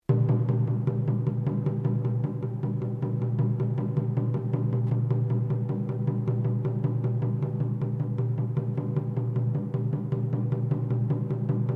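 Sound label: Drum Taiko mezzo forte Sequence, Japanese Taiko Drum; Miscellaneous Musical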